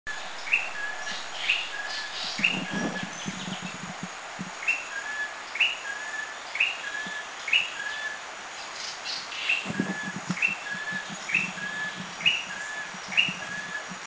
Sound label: Rufous-tailed Tailorbird